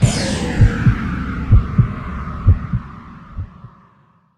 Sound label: Matrix Style Slowdown